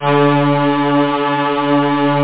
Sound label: synth